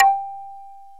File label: BOTL MARIMBA; OMI - Universe of Sounds